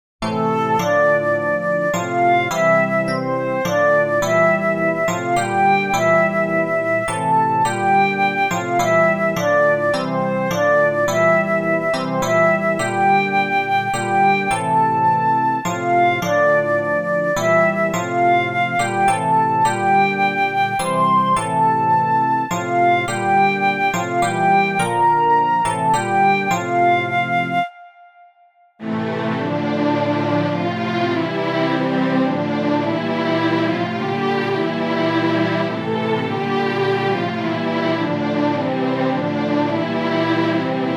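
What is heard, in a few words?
keyboard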